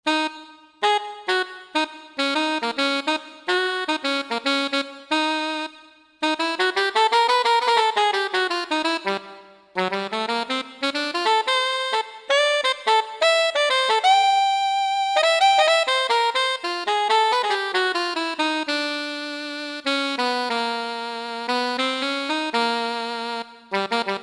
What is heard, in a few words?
Alto Sax, Roland JD-990; Factory Patches